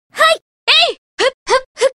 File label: hit; kasumi